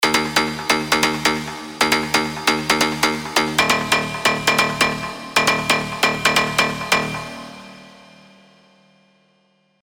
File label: Stab FM; Revealed Serum 2 Big Room Techno Drops Vol. 1